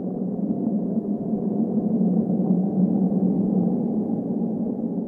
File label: dark wind, ambient